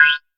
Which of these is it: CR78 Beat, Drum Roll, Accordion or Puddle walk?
CR78 Beat